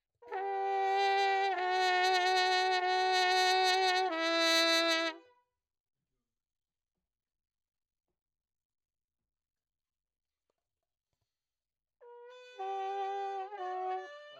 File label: Trumpet